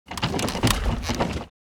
Motorcycle shaking the bike pushing the suspension; vehicle